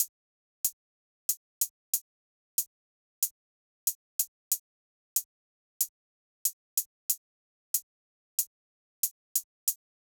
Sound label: Drum Loop - 93 BPM Hihat, Latin; Loop Stems; Cymatics; PARADOX II - Drum Loop Collection; Jammed